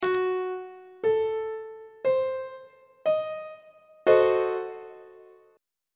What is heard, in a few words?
g flat diminished 7th chord on treble clef